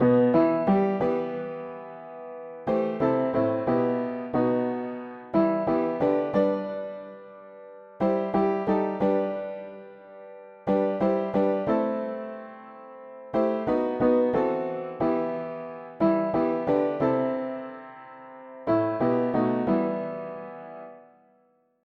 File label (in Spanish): mono piano; hymns; Himnario pequeno